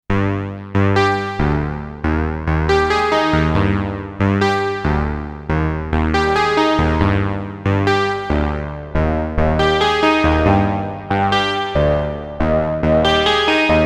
ASM Hydrasynth Harp Arp

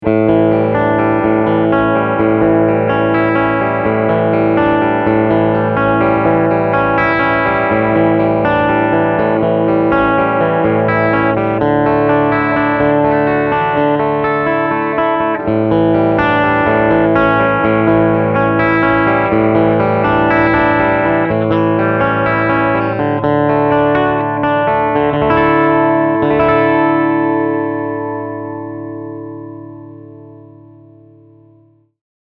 Fender AM Acoustasonic Strat

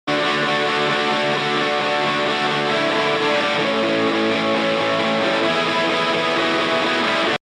Clean Guit

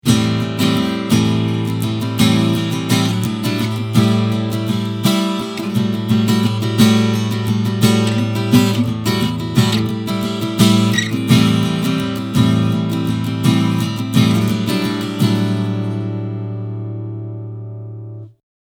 C1000 Strumming

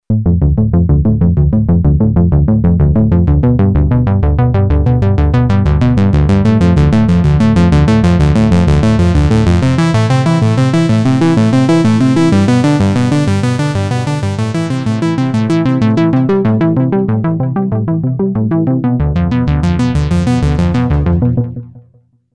Analog Osc Moog Filter Arp; RPG8

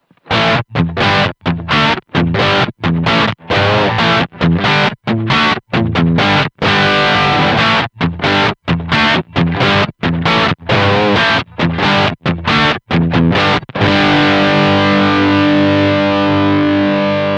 Two Notes ReVolt Guitar Preamp Heavy Crunch

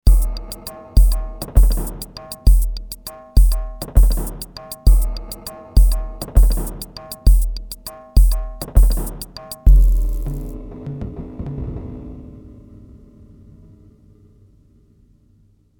tuned PCM; Volca

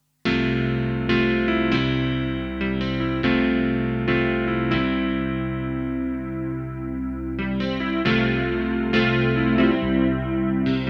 Eventide H3000 Rich Chorus E-Grand Voice

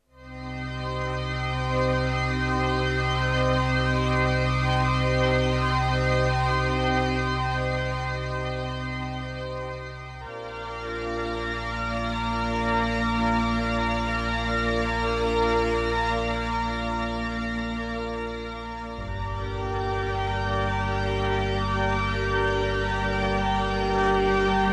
Korg Trident Strings

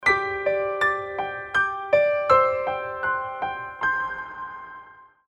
Roland S-330 Sampler Piano Original

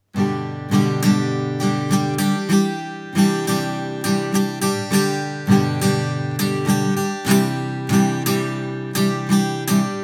Se Electric DCM6 e865s Guitar

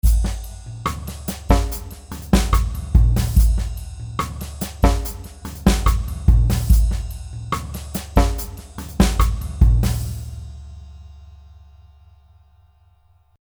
Jazzy

